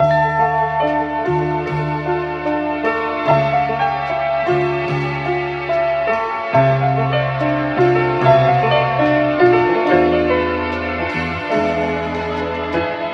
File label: piano, Loops Sad